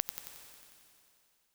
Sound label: spring